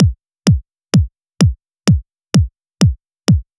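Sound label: Drum Loop 128BPM bass drum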